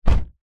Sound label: vehicles car door close internal; zapsplat